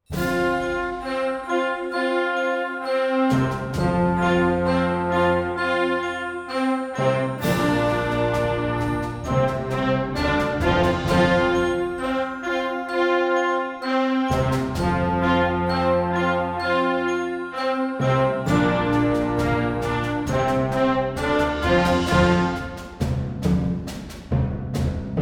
anthem of triumph; Excelcia Music

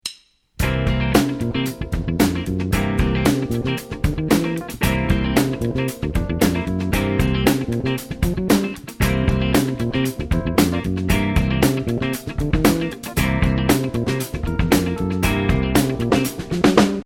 Chromatic Funk, bass guitar

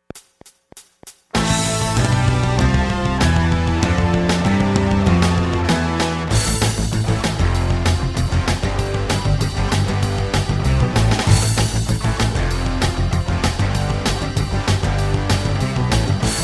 Aeolian Backing Track in A, guitar

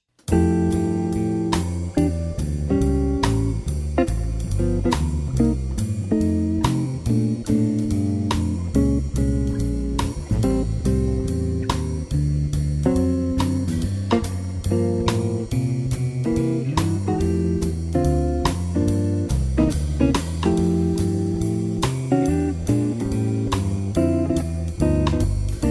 Bird Blues, jazz guitar

